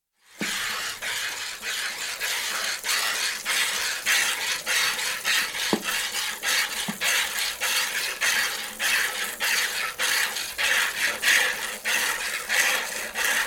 Goat, interior, milked by hand, milk poured into churn at end of band, low level occasional distant traffic; Goat Sound Effects; BBC